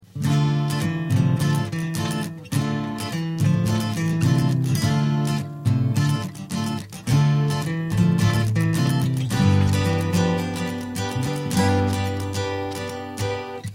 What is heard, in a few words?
Dowina DCE-333 LE sound studio